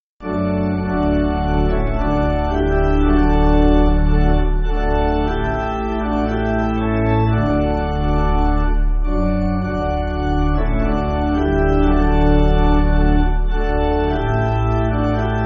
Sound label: Pipe Organ, church music; O Jesu My Friend; SS Union